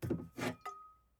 Picking Champagne Bottle; Fairlight Sound Lib

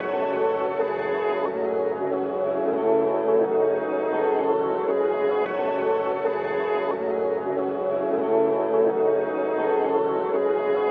Tonal Swarm - 88 BPM C# Min; FX; Triste

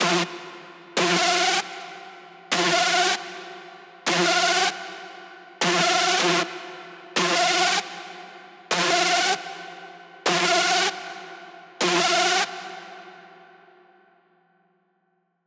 screech loop F min 155; Music Loops; GhostHack

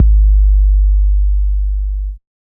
Bass, Dubstep 808 Basses; GhostHack

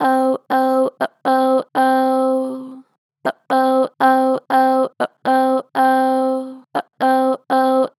Str Flat Oh Oh, Vocals, Pitch Flat Strong

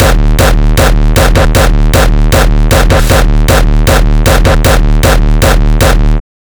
kicks loop 155; GhostHack

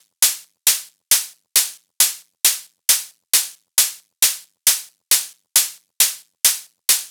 Hat Loop 135, Techno

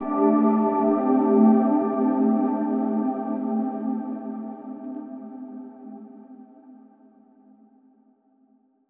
TEXTURE Mellow - 137 BPM A Min, Ear Candy; Cymatics; ANALOG EVOLUTION Beta

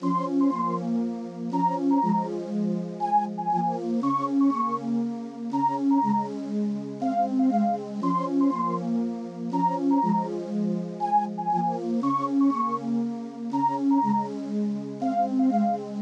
Bell Pad, 120 BPM F Min; Void

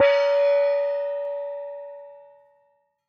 Gong Small; World Percussion; Cymatics; Emperor World Percussion

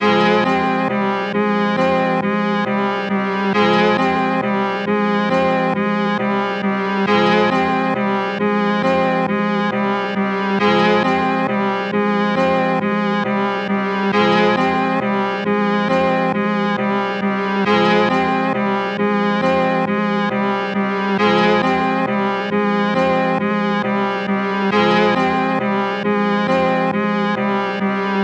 136 BPM F Min Pad, melody loop stem; Trap; Loop Stems; Cymatics; 2022 Melody Collection; Trap Pt 2; Hatred

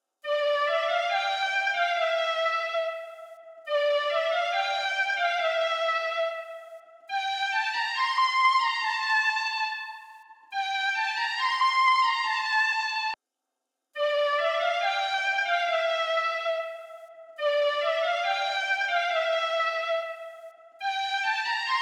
140 BPM D Min Flute, Drill; Melody; Loop Stems; Cymatics; 2022 Melody Collection; Smash

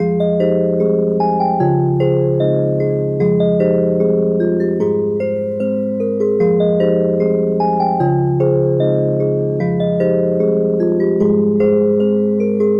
Nostalgic Melody Loop - 150 BPM F Min Bells; Melodies; Loop Stems; Cymatics; Oracle Sample Pack

